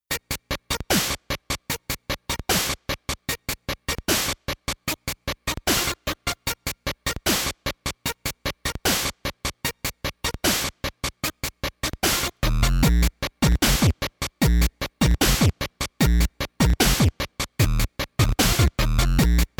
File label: instrumental beat; fanny packz